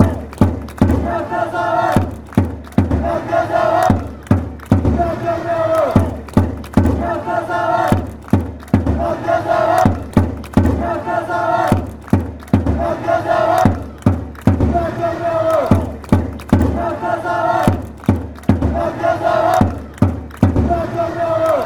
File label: japanese chant